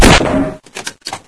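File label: grenade launcher, weapons; video game; source engine; hl1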